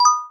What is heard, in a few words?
turn notification